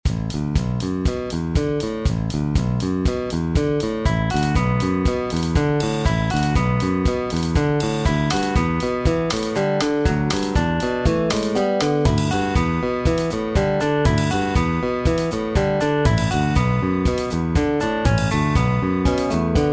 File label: midi music